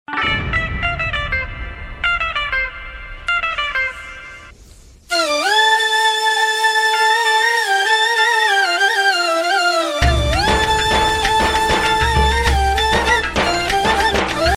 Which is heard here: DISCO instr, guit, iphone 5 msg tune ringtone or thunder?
iphone 5 msg tune ringtone